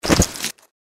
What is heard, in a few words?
gecko swing; npc